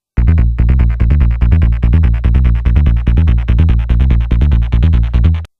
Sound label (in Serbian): Basslinija; Fruity Loops Studio v10